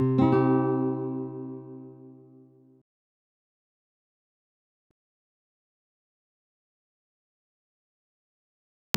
Playing Chords; betrayal at krondor

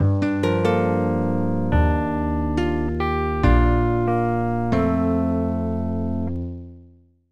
Music Day Over; Paws and Claws Pampered Pets 2; NDS

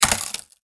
Break Box; Granny 3; Android

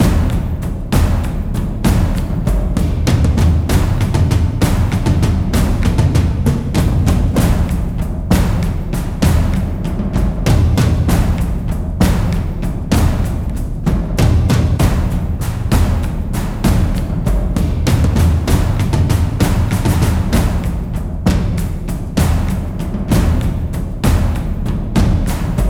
Suspense Drums; Scourge Outbreak

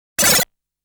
shooting sound

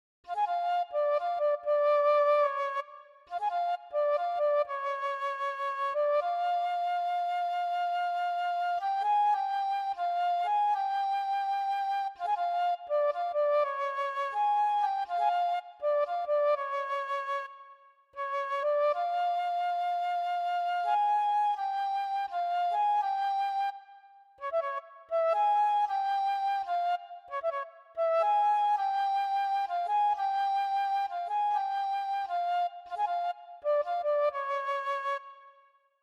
Flute by the fountain; Blackwell Legacy

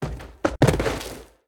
Death; Blue Mage

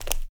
run marble stop

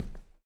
SFX Footstep Wood

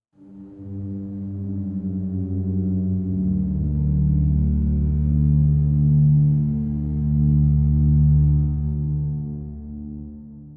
Organ Low; gamerip; that dragon cancer

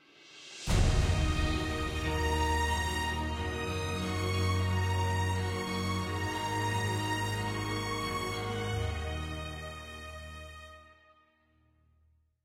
Stinger; Great Wall First Gate

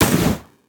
gun; Fight Knight

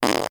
BODY Fart mono; Slette Mette android ios macos windows gamerip 2021

